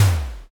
wall punch

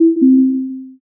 error

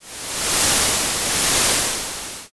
blizzard

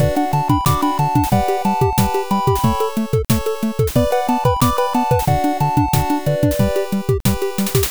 bgm

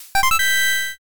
stream start

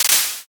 clap

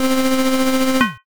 crane game

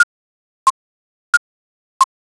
ticktock

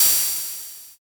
cymbal

